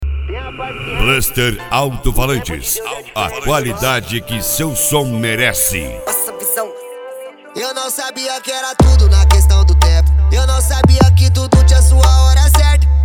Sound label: bluster alto falantes especial bass